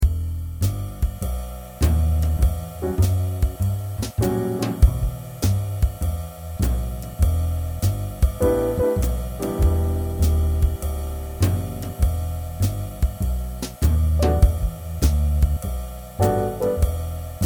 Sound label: backing tracks in em7